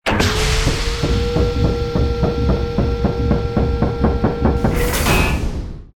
Room Rotating; Silent Hill Downpour

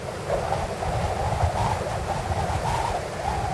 Wind Gusty Loop; weather fx; Silent Hill Downpour

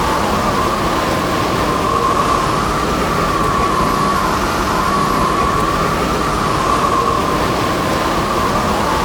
Wind Storm Loop; weather fx; Silent Hill Downpour

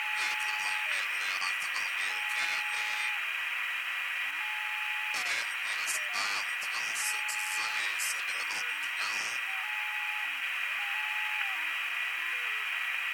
Radio Static; Silent Hill Downpour

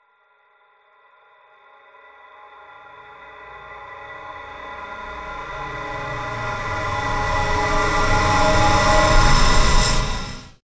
Evil Sweep; horror and atmosphere fx; Silent Hill Downpour

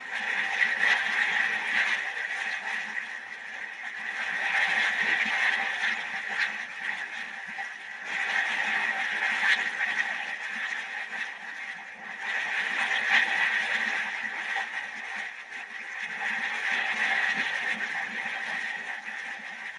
Water Flow Loop; horror and atmosphere fx; Silent Hill Downpour

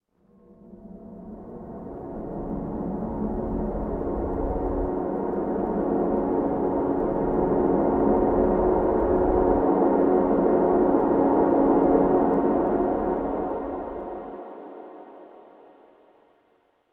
MUSIC MOTELL; sh origins

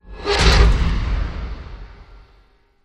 Pile Driver Heart Attack; horror and atmosphere fx; Silent Hill Downpour

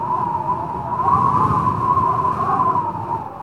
Wind Whistling Loop; weather fx; Silent Hill Downpour

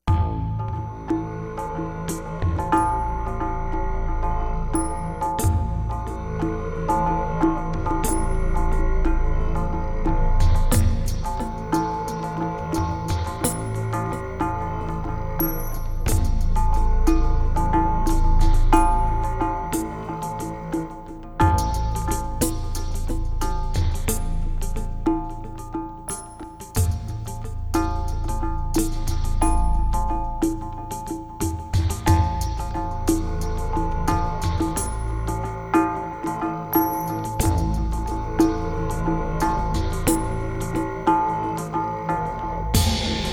Weird World Music; Silent Hill Downpour

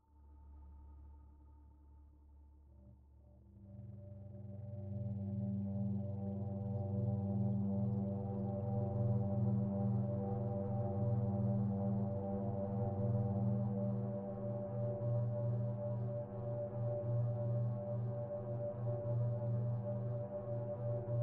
Synth Atmos Loop; Silent Hill Downpour

